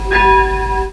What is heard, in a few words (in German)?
glocke